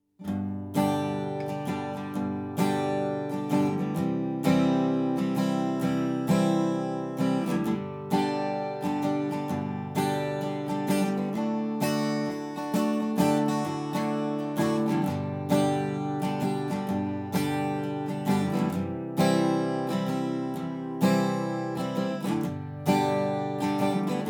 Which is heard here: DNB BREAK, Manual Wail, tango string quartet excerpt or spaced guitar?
spaced guitar